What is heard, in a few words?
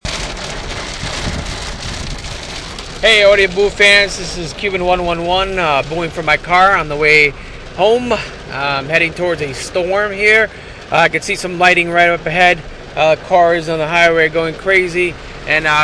thunder storm